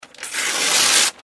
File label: paper rip